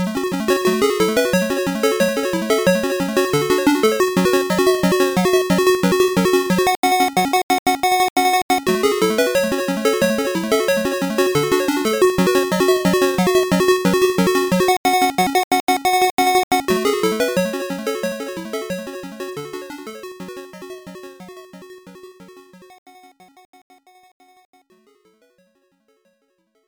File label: Piano; High School Kimengumi; Master System